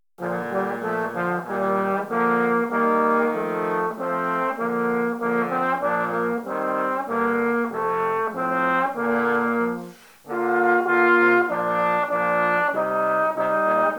Two samples of Trombone Trios

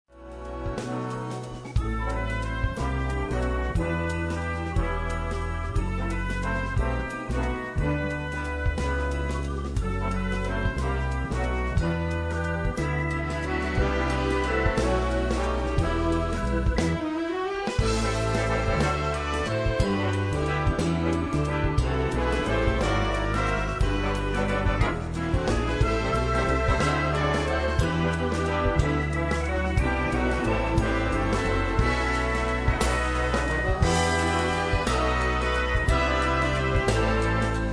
WALTZ

